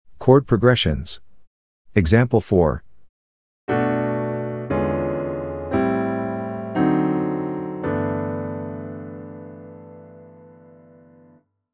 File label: chord progressions